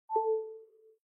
Apple IPhone Charging Sound Effect

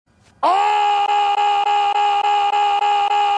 screaming meme sound effect; Smiling Friends

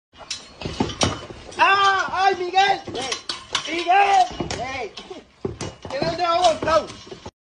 ahhhh; Tik Tok

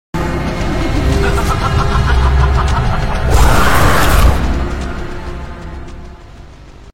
jumpscare; Poppy playtime; mommy long legs

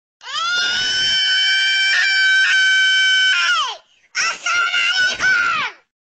bird screams Assalam Aleykum

